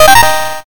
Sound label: win